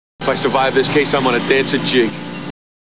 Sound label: jig